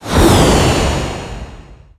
Jetcar Passby; Nicktoons Nitro; arcade

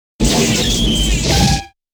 Robotic Sound Mix

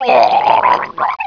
Drown; Team Fortress Classic

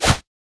Scratch up; New The Denpa Men

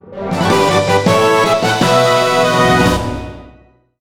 battle fanfare; Nexomon

